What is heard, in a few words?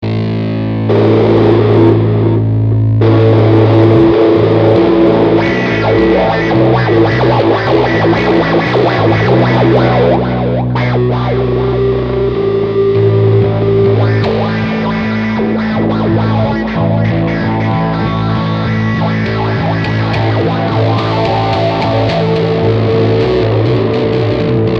electric; Circle of Triangles